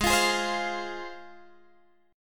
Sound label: Ab 6th Ab, Guitar, Open G